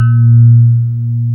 PIA DX E-PIANOS

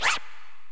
Korg x5, Drums

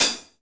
Pop Closed HiHat

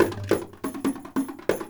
INDIA L; Spectrasonics - Heart of Asia CD2

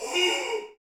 Bullhorn, Afro Horns, Africa

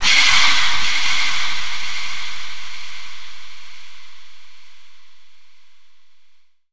VOICE FX, Dance Vocals

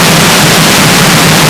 NOISE, PULSE SYN; AKAI S6000 CD-ROM - Volume 1; SAMPLE WAVE MIXING 2

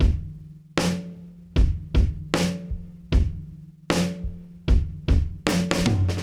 Beat Loops, 077; Zero-G; Monster Beats

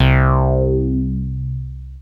Bass Synths hits and octaves

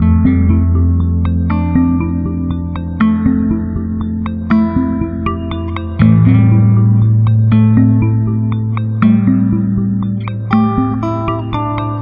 Melody, IDM

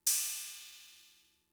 Cymbal, Fricke MFB-522, Drum Machines

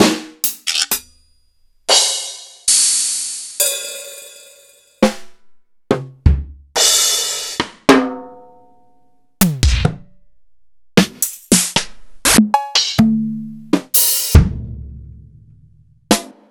EMU PX-7; m8

